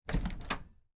Door Open French, Foley, Door, Open, French, Door; Miscellaneous Doors; BlastWave

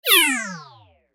Synth Descend; Descending; Multimedia; Blastwave

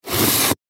Cardboard Box Large; Materials; Blastwave; Foley

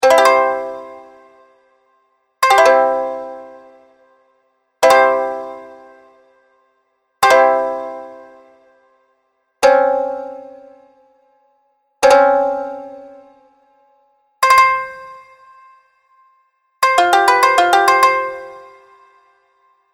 Menu Sounds Collection, tech sound effects; sound design

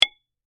Impact Metal Weight, Single; Crashes; Objects; BlastWave

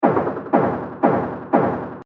120 Bpm Loop Mutant Kick Machine; Percussive And Rhythmic; Seamless loop; Drum Loops; Andrew Fleming

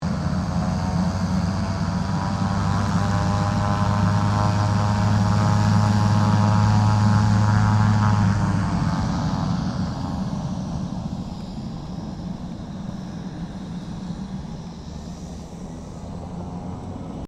King Air 350 preflight, stereo; Airplanes; Vehicles

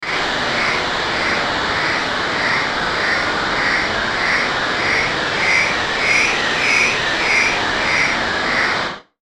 static pulse; Static Pulse Ascend; Production Element Imaging Element Accent Transition; Blastwave; Static Sound Effects

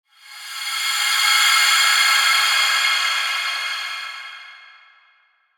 Sweeper Slow High; Production Element Imaging Element Accent Transition; Sweeper Sound Effects

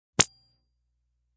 Lazer Sound; Laser Sound

